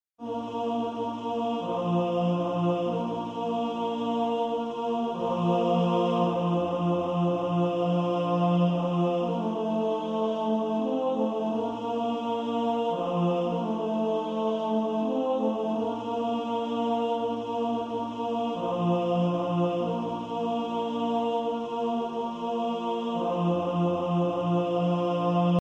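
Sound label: tenor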